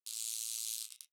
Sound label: substation oneshot; nuke